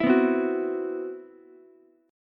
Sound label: C Major 7th Flat 5th, Ukulele, chord; CM7b5 strummed; JGuitar